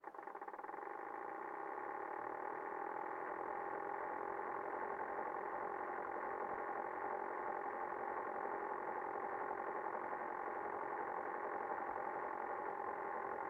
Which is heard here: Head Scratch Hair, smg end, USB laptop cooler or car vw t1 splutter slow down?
USB laptop cooler